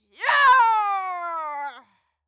female cheer; schreie; menschen

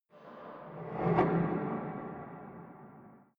strong metallic impacts, metall

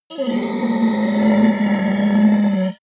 yeti groan pain long; kreaturen

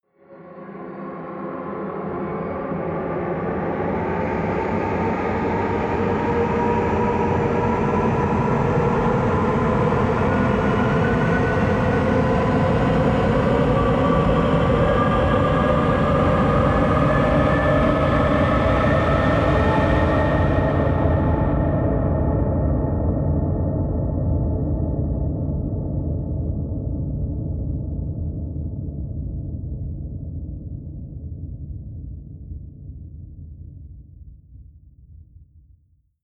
eerie long, drones

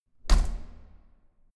corridor door close hard

